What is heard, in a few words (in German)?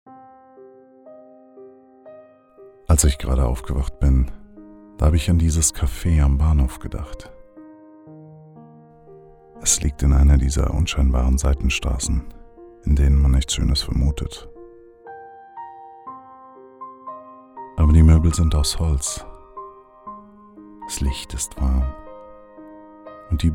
Café am Bahnhof mit Musik; Dieses Café am Bahnhof mit Musik